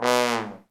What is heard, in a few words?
THE TROMBONE